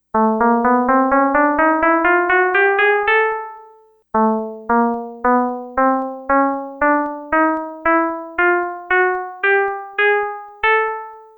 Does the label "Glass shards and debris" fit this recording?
No